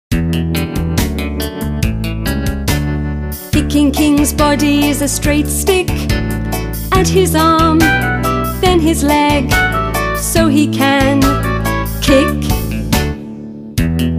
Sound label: Handwriting Song; 레터랜드